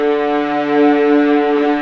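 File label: viola D3, ARCO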